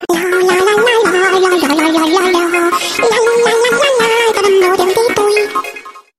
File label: happy girl